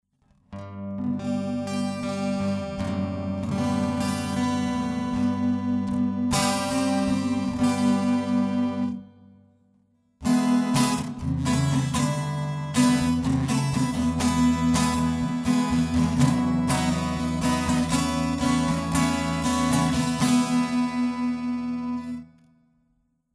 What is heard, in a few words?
acoustic processed